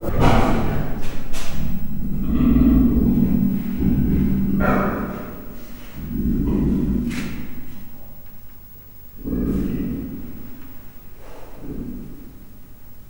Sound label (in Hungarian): horkantás, leopárd; miskolci zoo 2009